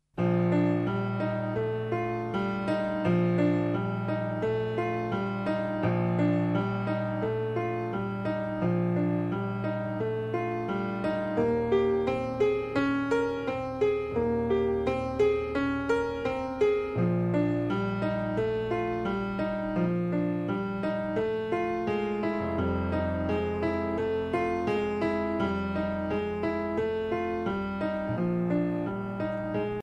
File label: Quartet, Blues